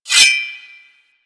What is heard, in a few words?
Blade Swipe; Warframe; Nemesis Boss; Blade Stabs